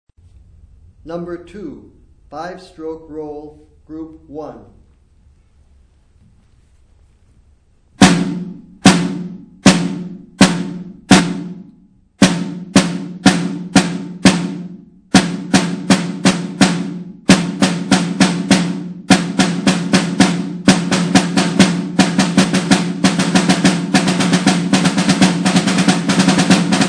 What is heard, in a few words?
snare rudiment